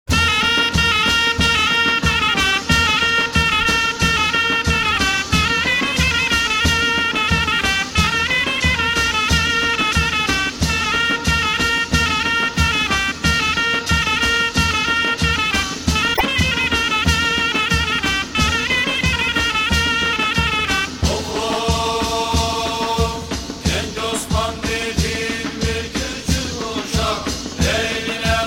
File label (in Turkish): Mehter